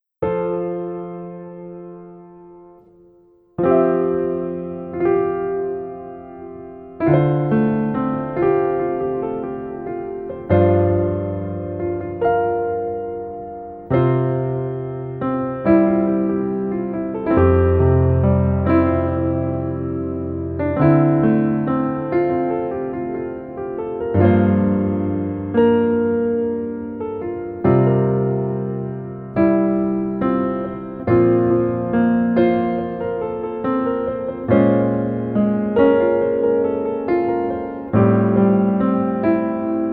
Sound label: Piano